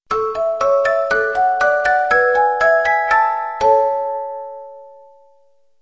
soft

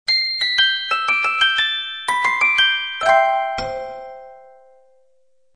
soft

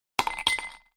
ice down

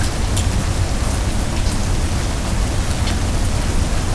rain

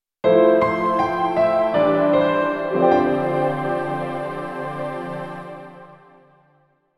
jingle

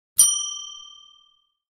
chiiin

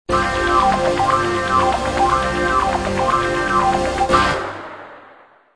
soft

